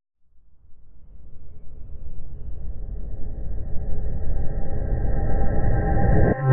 Swell; bottlerocket

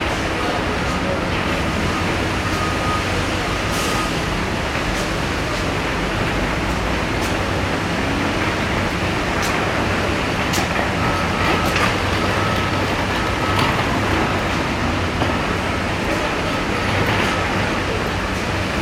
ambience construction site large ground zero nyc; sound effects one

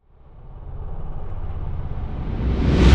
Swell Flutter; bottlerocket

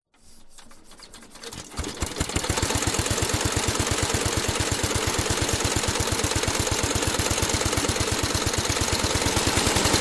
lawn mower petrol Motor Mower 24in Following; Ransomes; selectmood

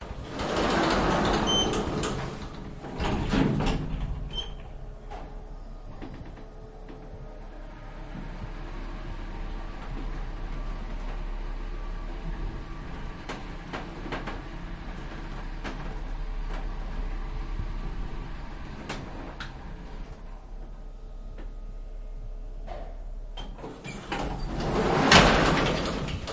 Elevatorride Doors Opening And Closing; Budapest Hungary

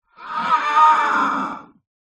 horror monster demon screech

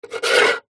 office pen biro draw line on metal box

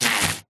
vehicles bicycle tyre skid stop

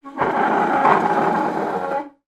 household chair stool drag hard floor; sound effects one

